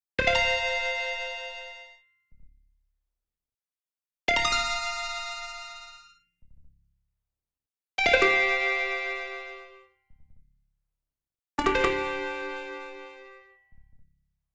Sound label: Arcade Jingle Neutral